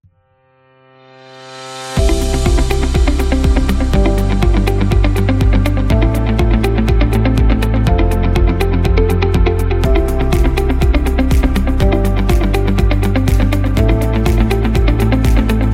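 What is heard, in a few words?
Healthcare, motivational corporate music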